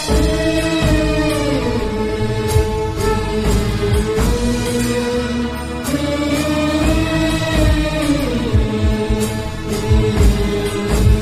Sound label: devotional vibration; Navratri Special